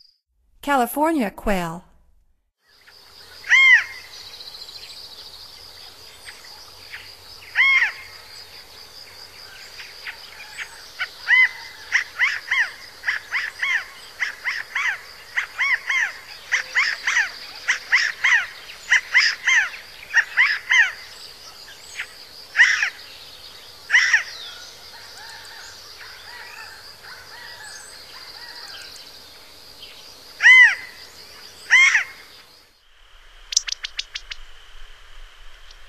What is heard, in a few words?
California Quail; North America; Stokes